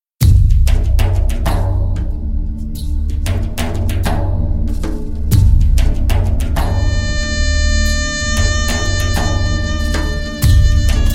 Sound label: The Cry Of The Soul (Instrumental Version)